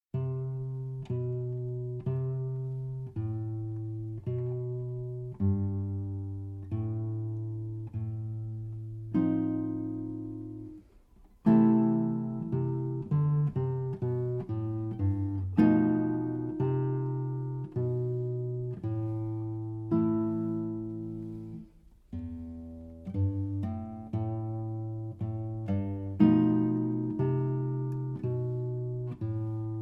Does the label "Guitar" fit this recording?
Yes